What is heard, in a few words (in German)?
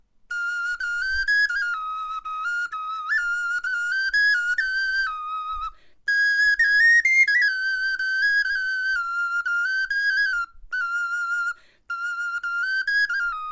melodie